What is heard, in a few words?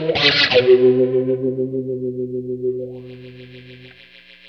DIRTY NOI, Funk Gtr & Wah; PlatinumPhattCD2; E-MU Producer Series Vol. 8 – Platinum Phatt